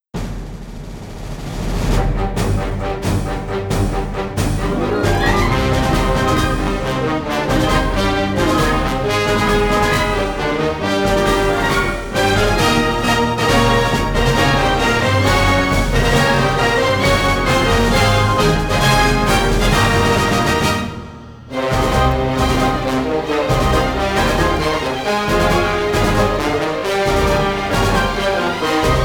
Battle; Crimson Skies: High Road to Revenge

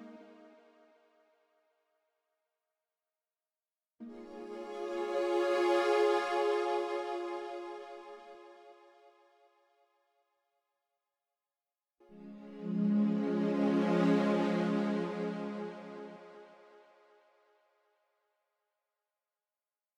Spirit Pad Swell; Proteus

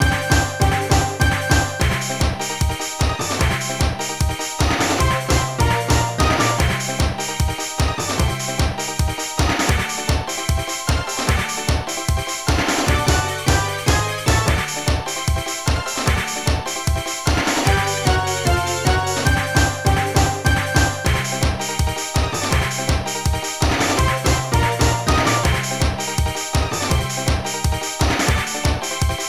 Warning BGM; Exvania; Namco NA-1; arcade

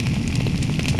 Ambient Fire; Mother 3

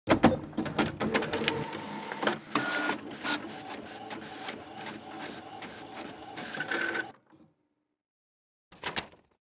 Printer

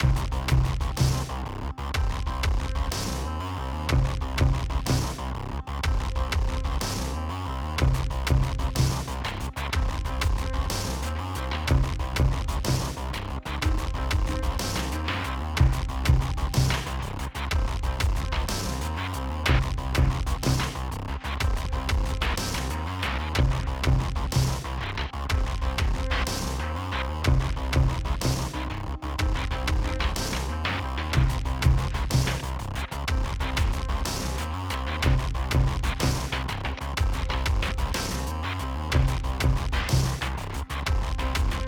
Cinematic Music - High Octane

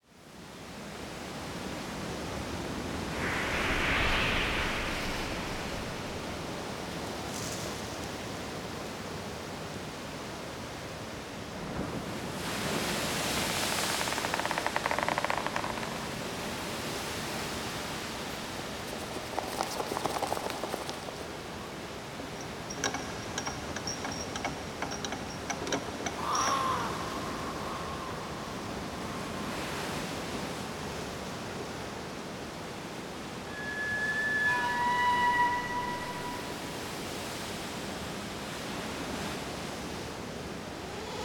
gothic ambience

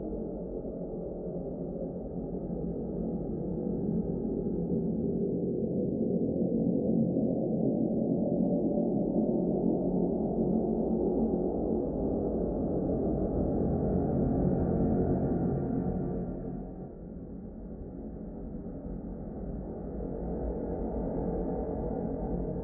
Atmo Loop; Insanely Twisted Shadow Planet

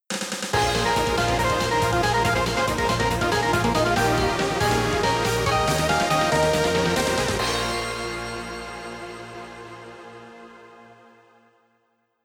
Victory; Etalus

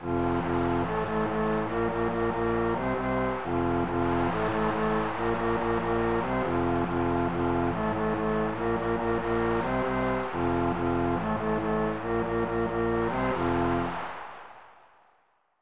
Baseball Fanfare; Tecmo Super Baseball 1994 SNES

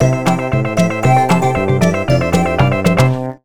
Sting Happy Dance; simanimals africa 2009 nds gamerip

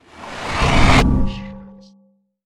fear confuse horror spell; Infernal Radiation

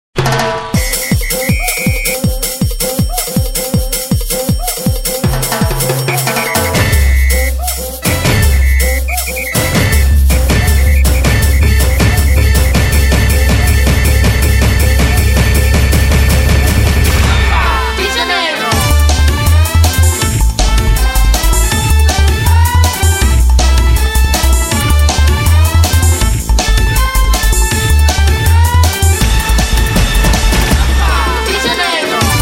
Bass Mix, SAMBA; JANEIRO; DDR Solo Bass Mix; dance dance revolution solo bass mix

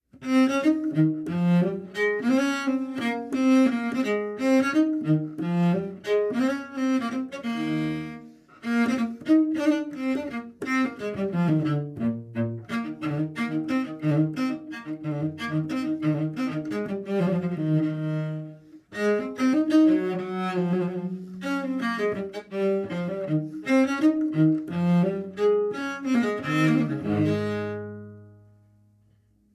Contrabass; Assassin's Creed Syndicate

